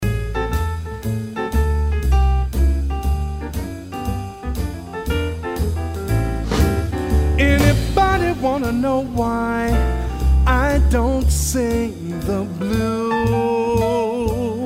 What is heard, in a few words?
Good News Blues